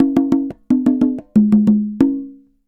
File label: Congas Poly, Festa Latina, Drums & Percussion; Best Service; Festa Latina VOL-1